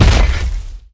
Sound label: step; gundyr